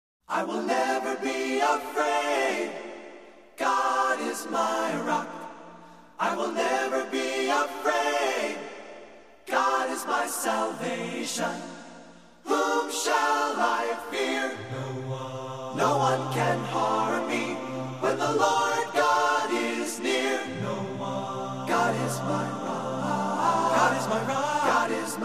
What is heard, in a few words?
acapella